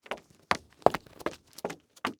Foley Footstep Hard Sole Shoes Jog By Short; Foley Footsteps

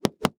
Staff Hit Body Multiple, weapons; AdobeCC

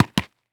Foley Footstep High Heels Dirt Debris Jump; Foley Footsteps

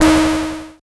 Buttons Mechanical

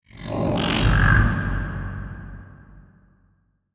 Production Element Title Transition Demonic

